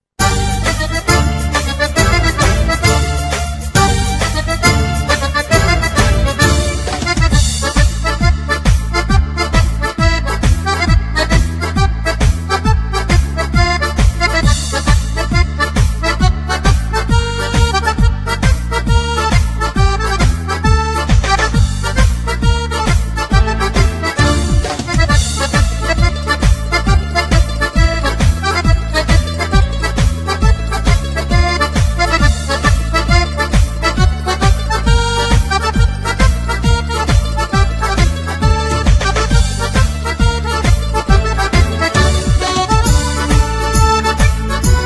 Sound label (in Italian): tarantella